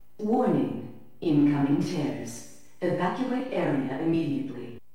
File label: incoming tears